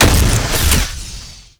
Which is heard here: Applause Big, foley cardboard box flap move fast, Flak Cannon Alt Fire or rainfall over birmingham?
Flak Cannon Alt Fire